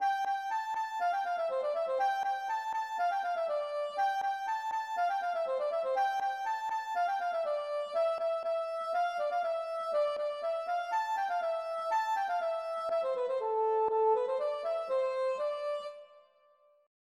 polka

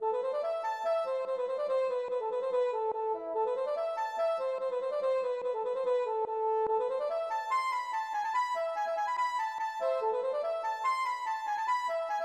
polka

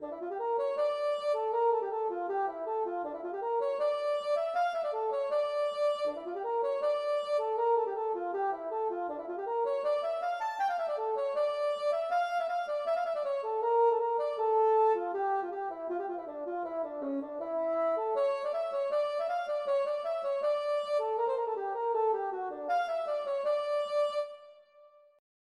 polka

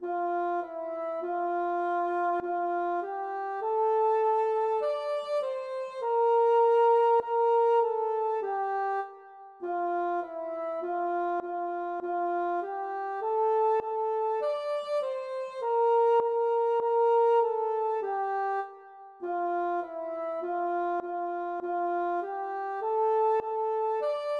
Tenor

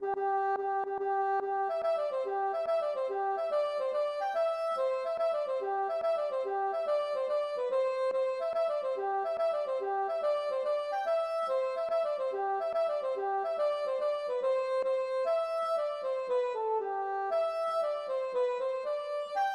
galop; Du Coglais

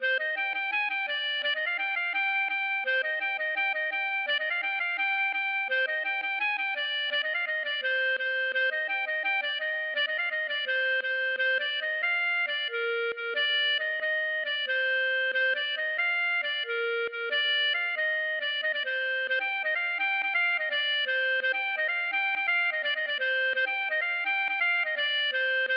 gavotte; Montagne Suite 1

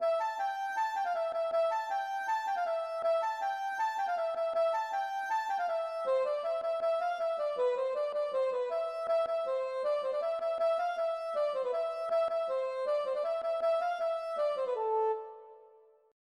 polka

